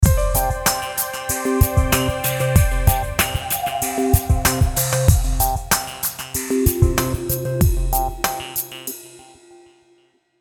Logo Drum Beat Synth Piano; Sonic Logo

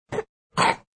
Chld Cough, Sick Toddler Coughs Quickly; Human

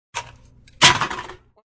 Tossing a can into the trash; Crunches

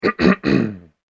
Man Clearing Throat; human

